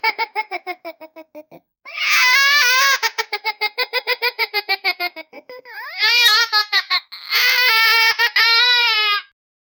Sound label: child laugh; kid